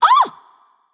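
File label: surprised, female; smite; voicepack; hera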